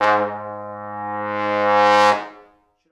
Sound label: Pop Brass; Best Service ProSamples vol.19; AKAI